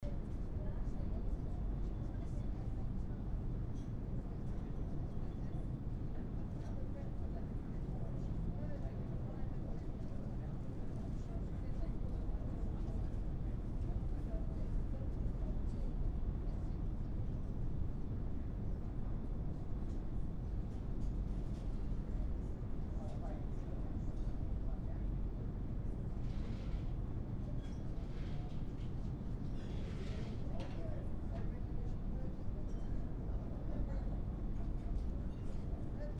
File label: Bar No Music Ambience